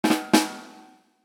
Rimshot bdum bheh

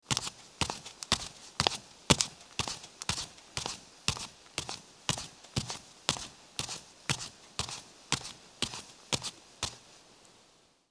Footsteps Bare Up

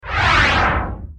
Whoosh

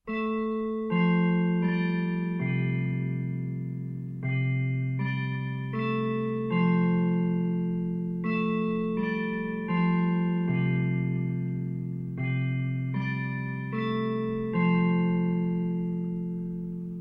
Town Clock In Distance Striking 1 O'clock

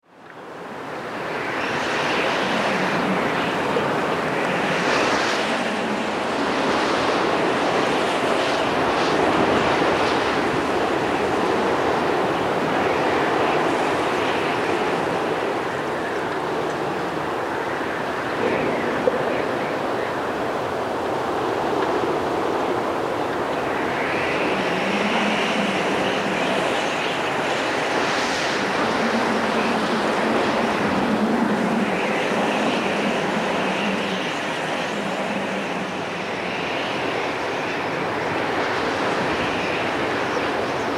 Wind

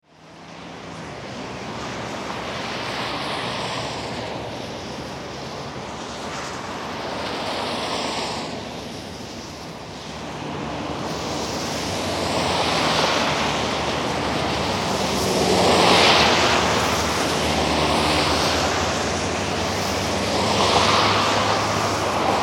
Traffic City

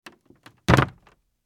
Footsteps Heels Wood Jump

